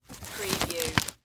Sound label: Umbrella Close; sound effects bible